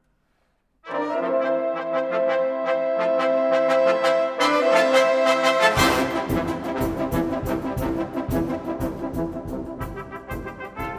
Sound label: brass